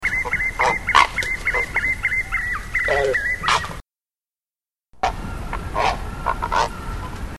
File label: Cocoi Heron, Nature's Songs, S. America